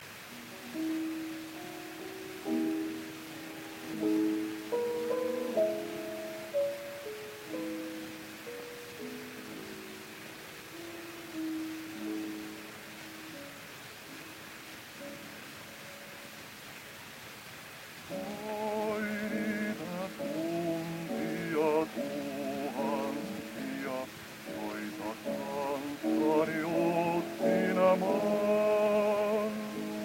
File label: Gramophone